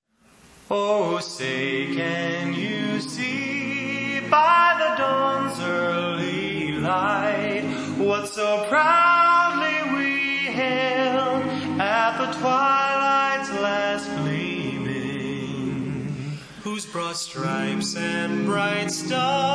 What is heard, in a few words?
anthem pitch bent